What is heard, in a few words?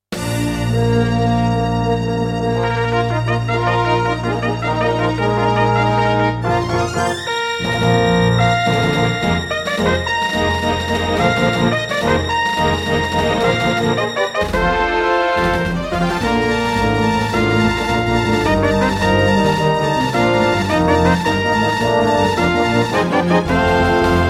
Intro, Roland SC-88; Dark Forces